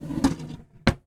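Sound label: drawer close; sonniss gdc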